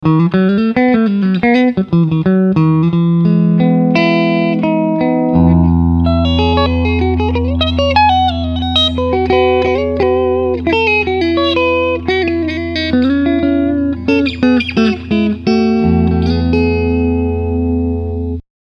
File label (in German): Super Viking Hals und Steg; hagstrom